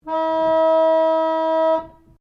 ORGAN PUMP CELLO E4 OCTAVES, Old portable church organ; Keyboards; Musical